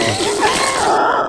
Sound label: death; slith